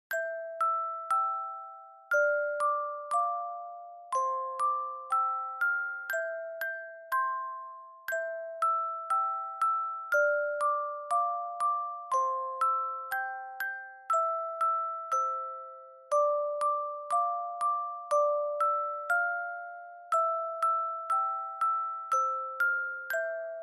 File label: Dry Fast; Chouchou